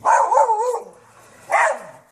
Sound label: Riding Dogs; SyrinScape SoundsScape Collection; bbh Haunting part 1; TTRPG